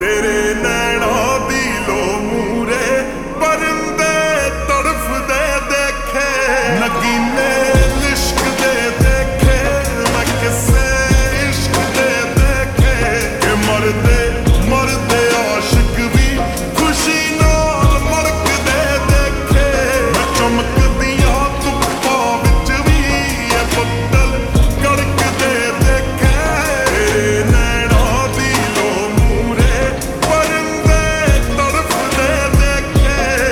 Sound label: Ringtone Slowed Reverb